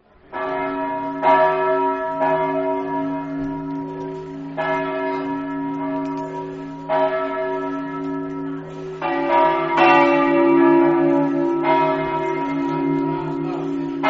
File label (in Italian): trieste, campane